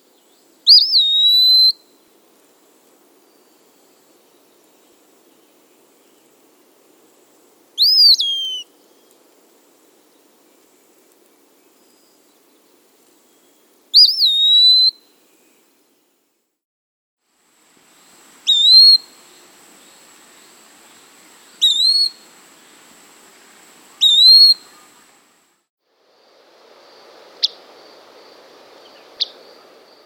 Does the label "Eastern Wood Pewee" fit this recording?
Yes